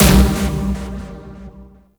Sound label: Bard EDM Pack A Slot 5 C; Warframe; Warframes; Bard Instrument Packs